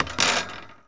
Street Ball Wood Back; SFX Kit

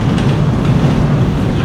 Door Large Metal Loop; Doors

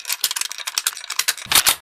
winchester reload; firefight

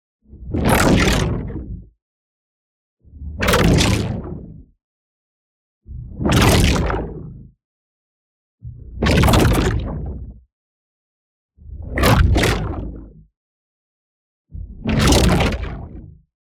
EXPLOSION LARGE Crunchy Tonal Ripping; boom library; Matter

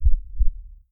Heart Beat; DeadFright

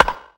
Baseball Bat Crack

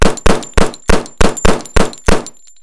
Gun, Hand, Two Fisted Firing; gun and weapon sounds; captain audio; fully loaded

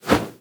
swoosh low; Blind Gladiator

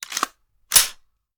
Machine Gun Foley; Sound Ideas; Guns

